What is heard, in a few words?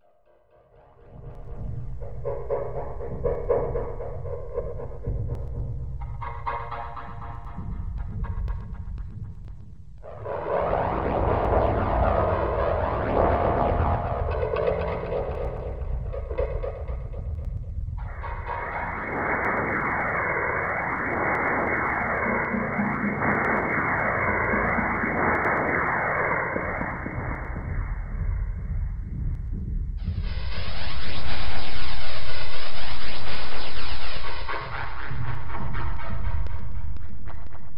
A Ionian 60, Deconstruction